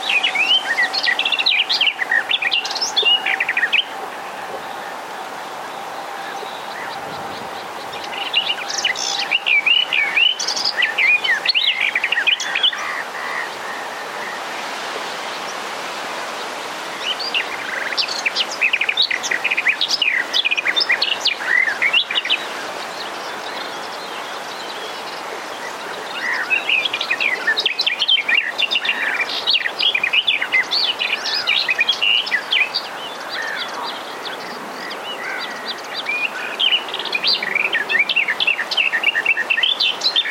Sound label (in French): fauvette jardins; lieusaint